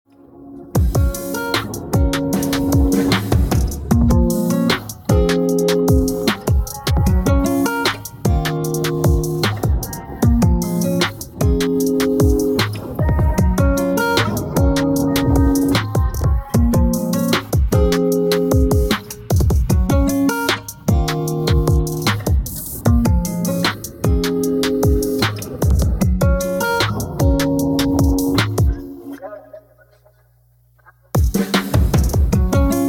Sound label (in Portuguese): varanda instrumental